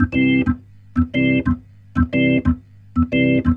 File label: Roots Reggae, B-3; Sounds Good